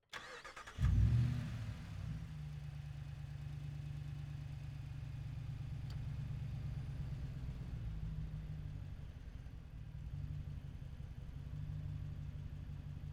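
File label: Dodge Ram Onboard Start Medium Drive Stop Reverse Stop Drive Stop Off Engine; 1500 HEMI SUV 2014; MKH8020; Pole Position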